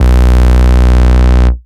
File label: Root B, Ultimate Bass; Vengeance Samples